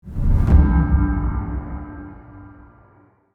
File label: Horror accent eerie hiss